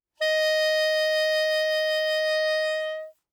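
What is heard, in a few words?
Soprano Saxophone vibrato ff Eb5; Woodwinds; Bb Soprano Saxophone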